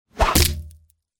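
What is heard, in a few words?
throwing with cracking of wood; Звук ножа; Звук метания ножа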